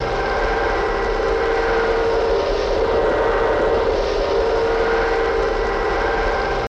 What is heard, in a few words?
Torpedo Jet By, torpedos